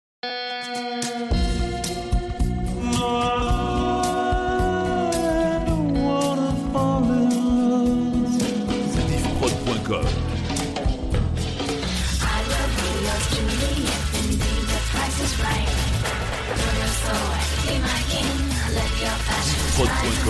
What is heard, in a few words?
Medley SoundTracks movies BO